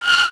plane tire, Impact

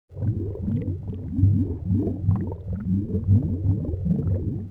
underwater; Soldier Of Fortune II Double Helix; XBOX; ambience

